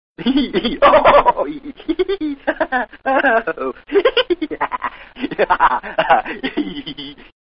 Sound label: Aaaaaa